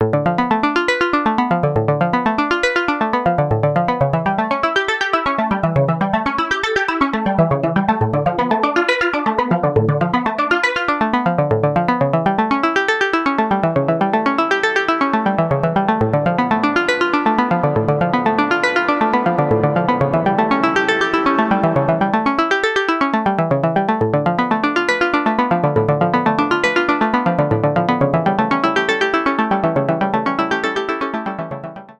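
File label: Arpeggio Chorus Reverb Delay; WAVES Element 2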